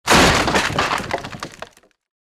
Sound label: wood box crash